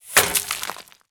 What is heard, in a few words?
ballista hit ground